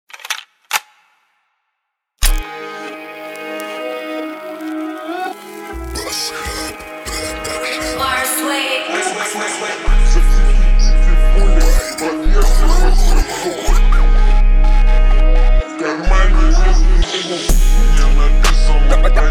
31-35Hz Low bass

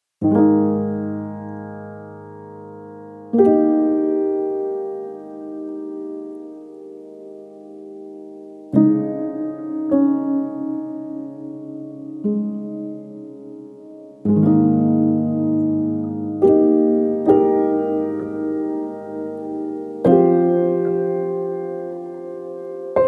Piano

